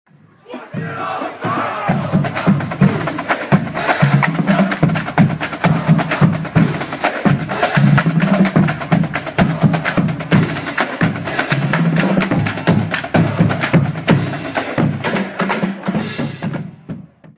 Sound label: marching band marches toward stadium; university of michigan; to beat ohio in 2011